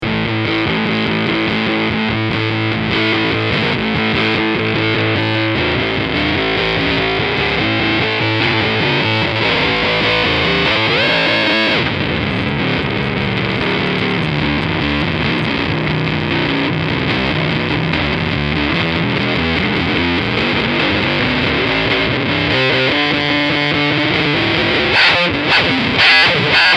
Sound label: bass